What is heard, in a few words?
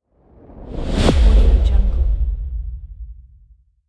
smoke impact reveal